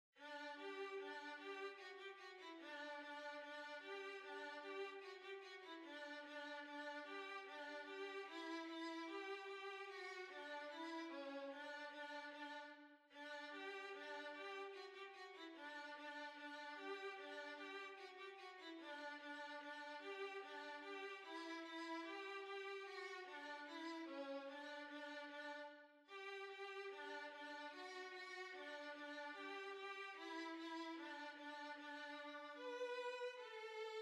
Violin

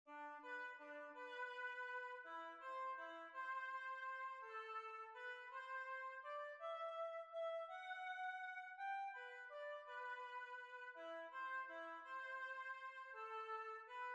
Waltz

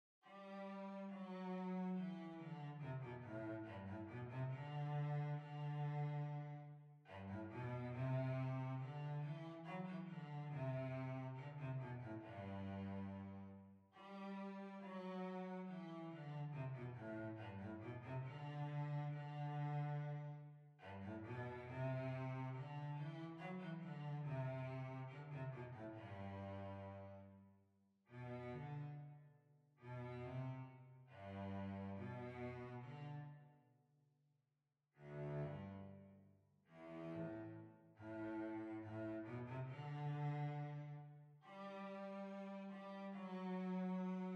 Violoncello

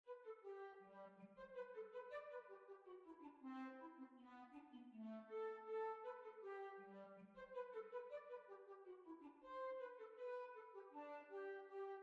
Hornpipe; Sadlers Wells Hornpipe

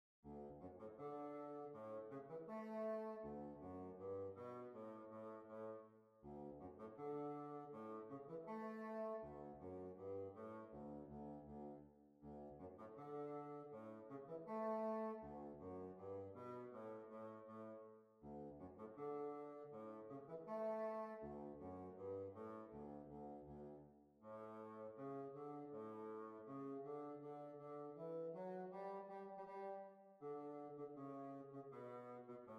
Hornpipe

